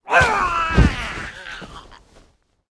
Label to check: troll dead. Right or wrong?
Right